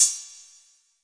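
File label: Roland CR-8000 cymbal; DRUM MACHINES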